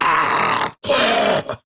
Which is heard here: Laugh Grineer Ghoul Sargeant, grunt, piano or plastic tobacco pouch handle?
Laugh Grineer Ghoul Sargeant